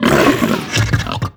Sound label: pull grunt, barnacle creatures; Half Life Alyx